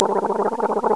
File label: GARGLE; Leisure Suit Larry 6 - Shape Up or Slip Out; Floppy DOS; scummvm